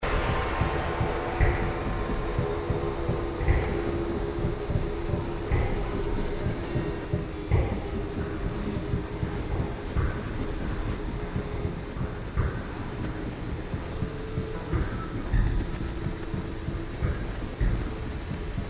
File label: haunted machinery